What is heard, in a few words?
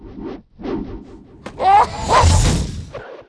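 mask attack; monster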